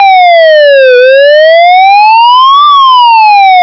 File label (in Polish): syrena